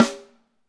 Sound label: SD, KIT Real-Kit; 90 s Sample CDs; Sound & Vision - Gigapack I CD 1 (Roland)